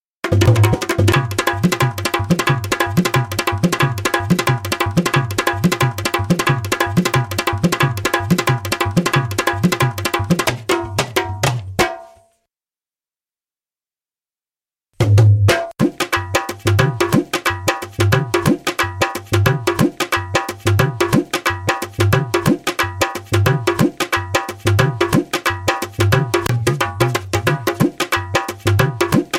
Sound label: Loops Dholki (90 BPM)